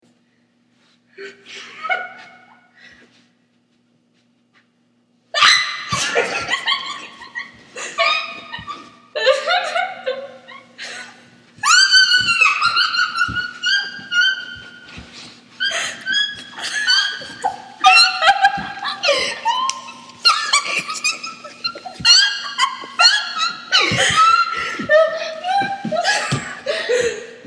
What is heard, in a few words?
Laugh Song